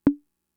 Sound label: Conga, Fricke MFB-522